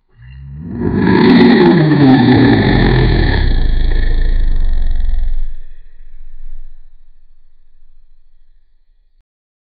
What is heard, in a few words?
roar, kaiju; godzilla